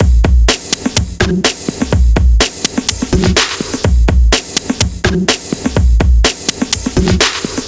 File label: Combo D Kit